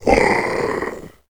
troll die; wesnoth

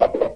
magic thorns miss; wesnoth

